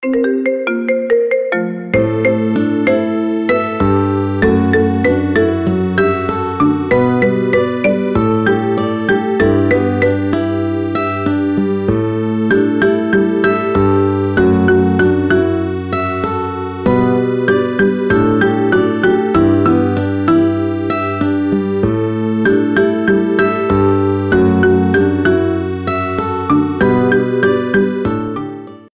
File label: marimba remix ringtone